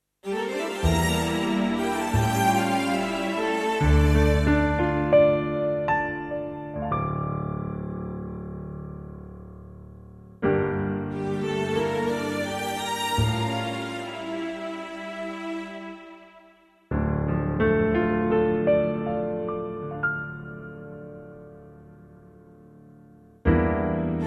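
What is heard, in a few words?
D, Backing Tracks for Male Vocals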